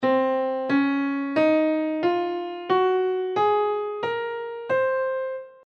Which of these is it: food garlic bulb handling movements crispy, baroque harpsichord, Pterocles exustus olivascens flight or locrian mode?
locrian mode